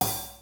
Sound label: half hat